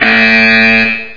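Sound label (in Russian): промышленный звук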